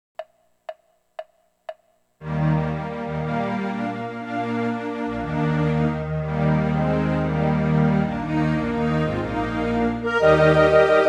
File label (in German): hornkonzert orchester; mozart